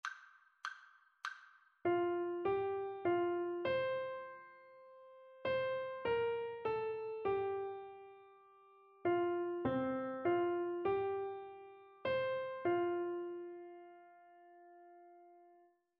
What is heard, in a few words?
melodic error detection; ear training